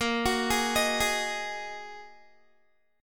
Bb 7th Suspended 4th Sharp 5th, Guitar; arpeggiated; 18,21,18,20,20,x; 4E,4A,5D,5G,5C,6F; Bb7sus4#5

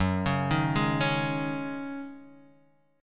Db Suspended 2nd Suspended 4th Gb, chord

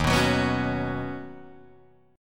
Eb Minor 7th Sharp 5th Eb Guitar Drop D

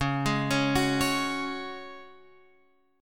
C sharp Suspended 4th, Guitar Open F; C#sus4; arpeggiated; x,8,8,8,9,8

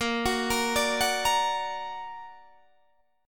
Bb Augmented Bb down Guitar Open D

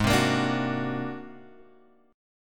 G sharp 9th Flat 5th G sharp Guitar Open G

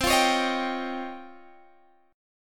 C sharp Major Sharp 11th C

